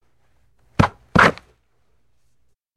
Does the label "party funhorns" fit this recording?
No